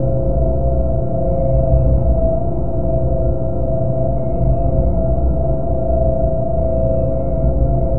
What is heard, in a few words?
Drone Ambient; Drums & Drones